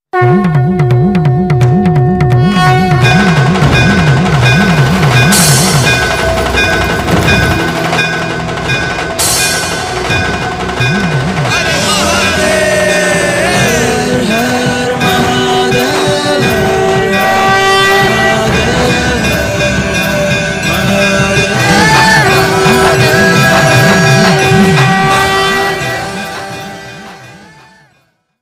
hindi bhakti ringtone

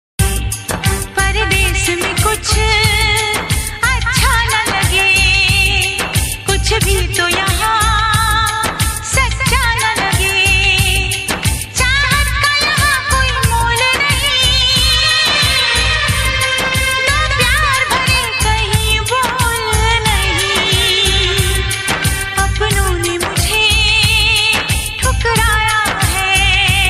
Female, Hindi Ringtone

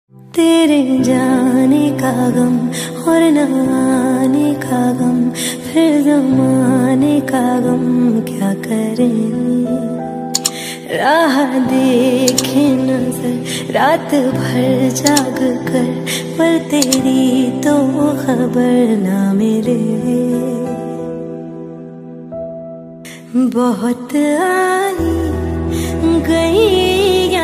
female version hindi ringtone

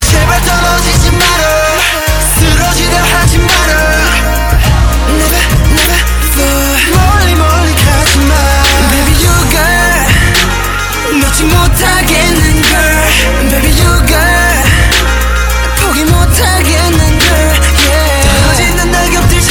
korean ringtone

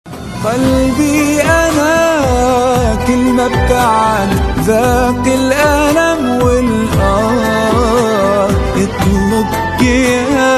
arabic ringtone

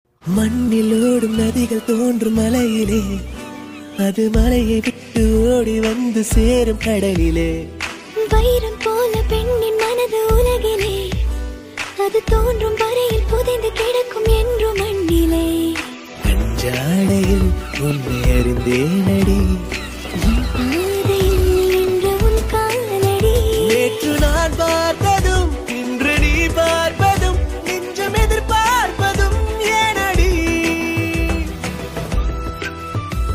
Tamil love tone

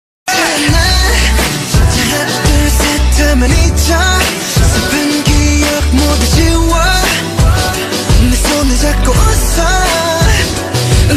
korean ringtone

